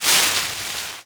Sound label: cash; langeles